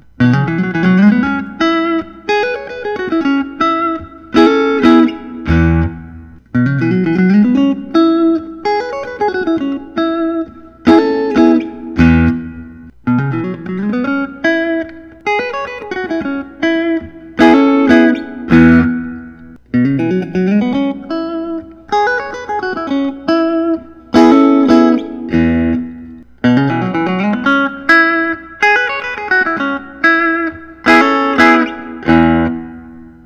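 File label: S style Brazilian rosewood electric guitar; lentz pre lawsuit; vintage blonde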